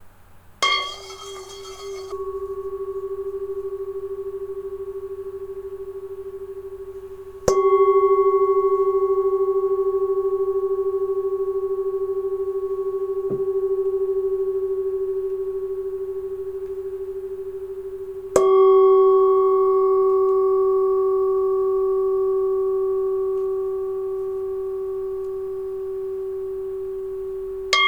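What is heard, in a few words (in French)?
bouddha bol SOL, bols chantants tibétains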